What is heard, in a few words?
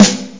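acoustic snare; gmplay; drums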